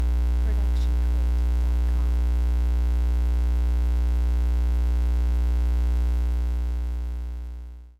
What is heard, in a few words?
TV CRT Ground Noise 60Hz US Loop; SoundsCrate